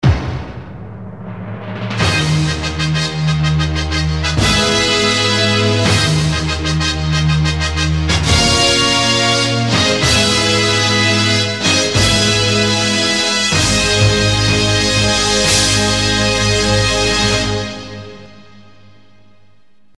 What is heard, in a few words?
Fanfara CORG Long